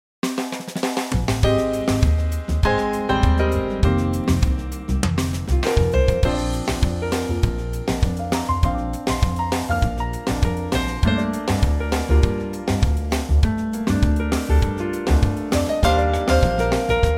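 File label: B flat to C trio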